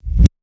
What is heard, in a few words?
bd rev